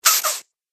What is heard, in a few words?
Cartoon Pumping Sound; Cartoon Sound Design Sound Effects